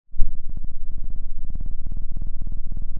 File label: pop, Sound of a cat purring